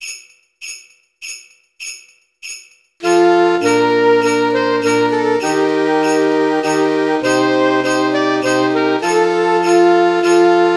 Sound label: We wish you a merry christmas Sax; Saxophone